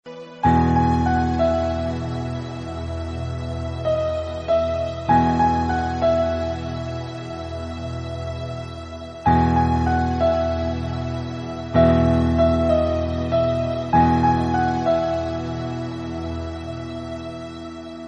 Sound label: instrumental bgm piano